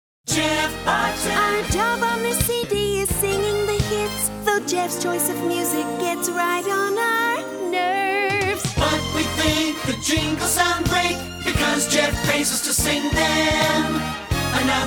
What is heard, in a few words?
Jinglebells